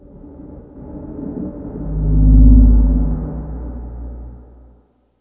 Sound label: eerie